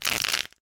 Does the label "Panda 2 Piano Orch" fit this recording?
No